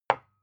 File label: glass put down; objekte